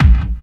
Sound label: KICK hardcore, Techno Trance DRUMS